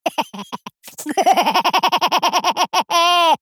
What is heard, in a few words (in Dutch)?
cartoon lachje lang